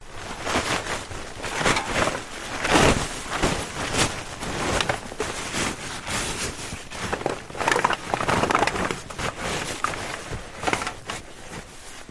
Snowmobile Ski-doo adjusting plastic sheet on trailer with empty cans; your free sounds